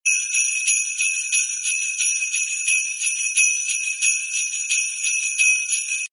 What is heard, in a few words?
christmas sleigh bells ring